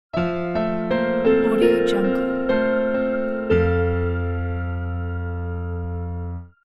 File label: elegant bright piano logo